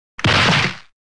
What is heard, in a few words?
body falling down sound; dragon ball z